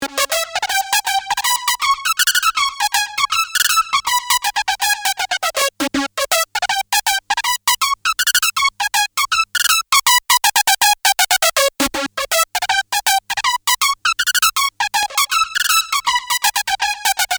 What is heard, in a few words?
Chorus; SuperOBIE